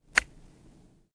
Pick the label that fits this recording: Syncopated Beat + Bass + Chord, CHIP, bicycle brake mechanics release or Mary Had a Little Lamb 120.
CHIP